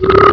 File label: croak; ANIMALS